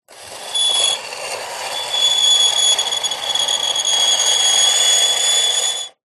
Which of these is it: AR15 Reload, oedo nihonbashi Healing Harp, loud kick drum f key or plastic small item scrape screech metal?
plastic small item scrape screech metal